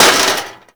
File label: Metal Damage; Warframe